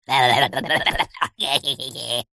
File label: cartoon laugh male med high pitched crazy bonkers